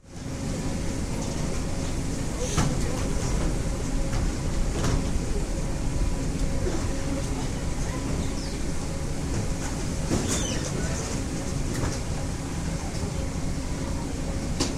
zvuki bagazhnoi karuseli v aeroportu

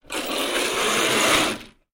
zvuki s effektom peremeshcheniia videokamery

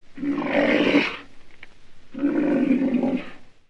zvuki nosoroga

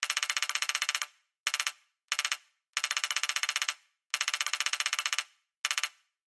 elektronnye zvuki peredachi dannykh

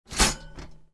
zvuki tostera

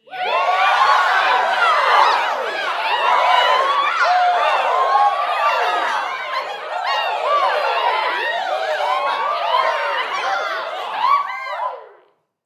zvuki dikogo zapada

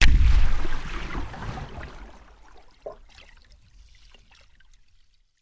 zvuki bochki

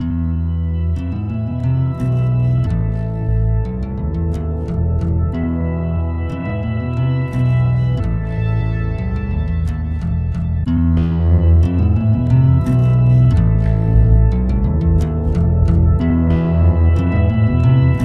arabskaia fonovaia muzyka bez slov

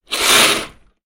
zvuki s effektom peremeshcheniia videokamery

